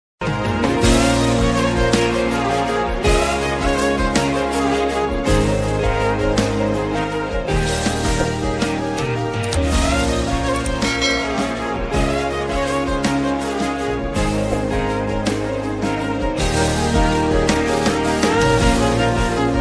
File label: Bgm Ringtone; Allu Arjun